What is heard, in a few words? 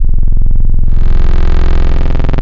Groan IV; Synthetic's Serum Collection; XLNT-Skrilla Tables